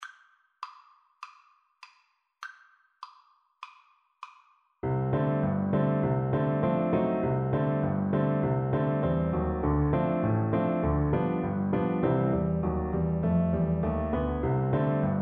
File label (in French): quatre mains, Piano Duet